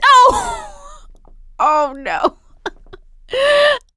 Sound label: laughter; producer